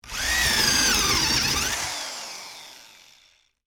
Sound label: Electric Drill, Industry, Electric, Drill, Wood, Bit, Into, Wood; Drills